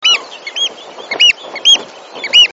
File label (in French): Huitrier Pie; Hematopodides; Échassiers; L'Huitrier-pie; Les Hématopodidés; Huitriers-pies